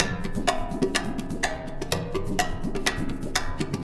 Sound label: Tabla, 125 BPM; Zero-G; Pure Tabla